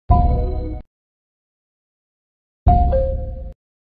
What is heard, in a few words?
notification sound; xat